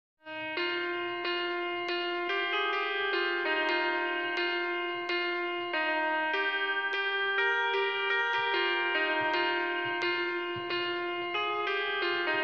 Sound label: piano music